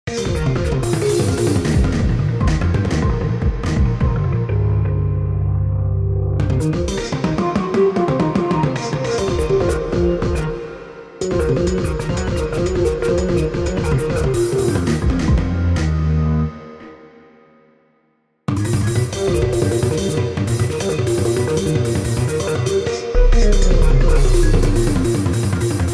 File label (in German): distortet